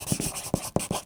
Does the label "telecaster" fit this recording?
No